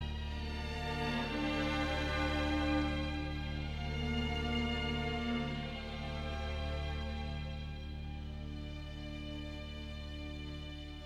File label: Violins